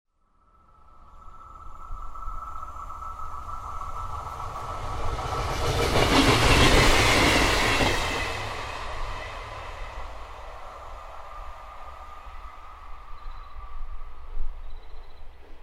MR-tog forbikørsel; 2. maj 1999, Mellem Kongsvang og Viby station.; Peter Bødker

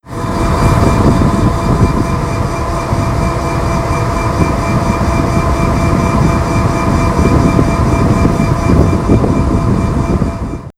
MZ1453 motor, MZ tomgang; DB Mz1453; 11. april 2025, Langå St.; Silas Lang

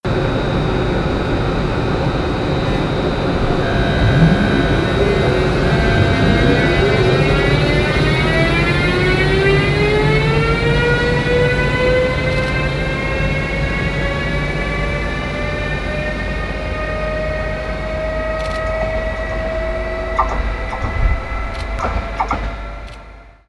DSB EB igangsætning; DSB EB3218 igangsætning.; 16. juni 2022, Tommerup; Jacob Andersen

